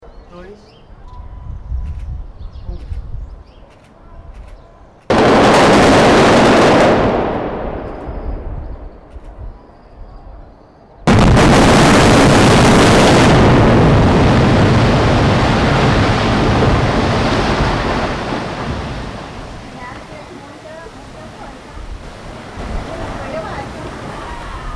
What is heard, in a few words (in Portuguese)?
implosao torre; cidade